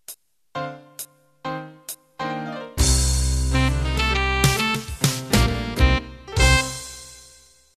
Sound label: Club Jazz End, MIDI